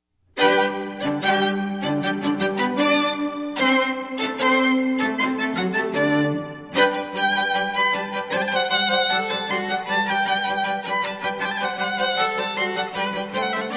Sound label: Quartet; Eine Kleine Allegro